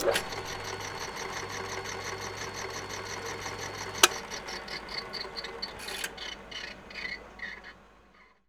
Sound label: DRILL; AKAI; 1000 Sound Effects